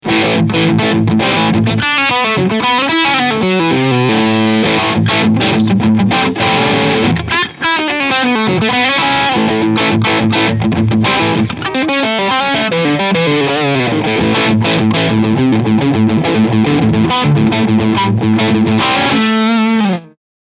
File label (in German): Leadsound Steg PU